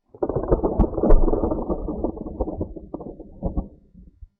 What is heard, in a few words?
thunder; daynight